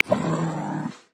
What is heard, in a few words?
Eastern Grey Kangaroo, Australia; Xiaomi Redmi-Note-8-Pro